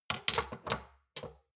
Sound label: shot glasses stack soft; soundpool